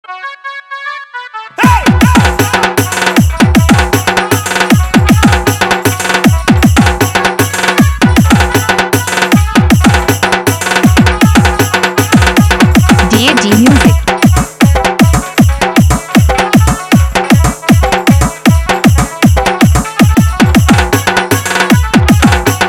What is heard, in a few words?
Bandparty Tuning; Odia Artist Spl Dj Mix